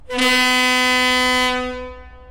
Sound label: Leslie, horn honks